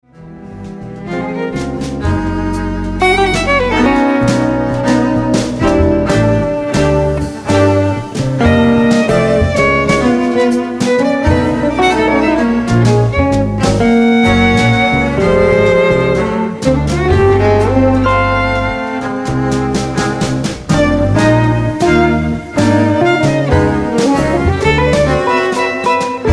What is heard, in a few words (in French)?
serpent de mer, EHarp; natural reserve